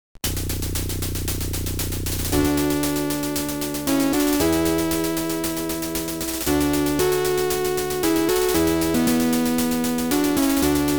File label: OPL2; Ending